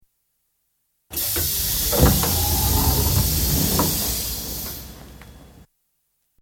London Underground, District Line train sliding door opens; BBC